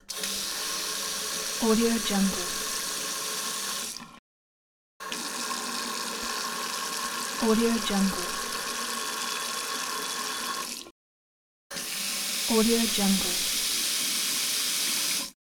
water faucet

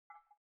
elements water dabble single glas bowl soft; smartsoundfx

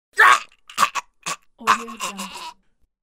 terrible death male

